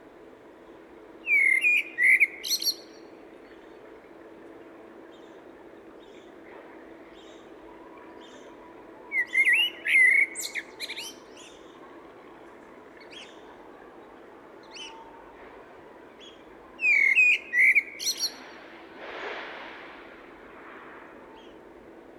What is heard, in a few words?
Koltrast Turdus merula; narvaroovningar; Sahlgrenska universitetssjukhuset